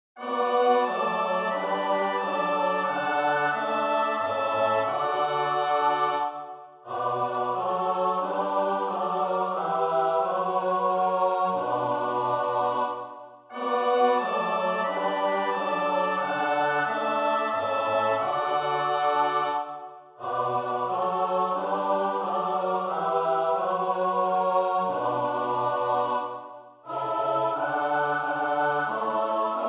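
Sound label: soprano alto tenor bass; Bach (Johann Sebastiaan); BWV 219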